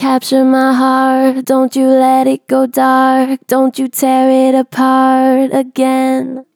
Gen Vib Capture my heart, Vocals, Natural Vibrato Gentle; Harmor Vocals